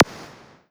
Roland SC-88 High Q

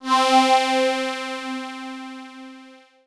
M-Audio Venom 70s Strings C4

